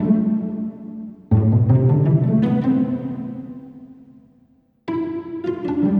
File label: Cello pizz, cello pizzicato; струнные инструменты; movie score